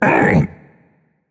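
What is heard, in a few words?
non-verbal ouch; smite; atlas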